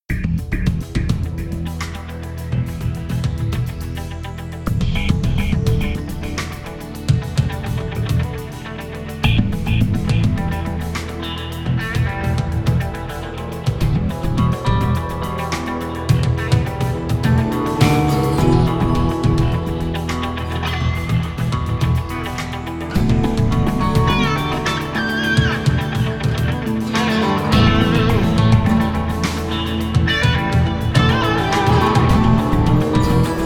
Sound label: Mission Music Rhythmic Tension Full; StarCraft 2 Wings of Liberty